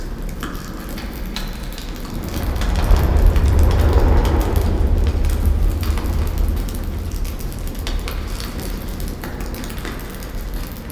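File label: amb apocalypse loop, ambient city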